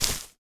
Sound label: Footstep Grass Heavy; Footsteps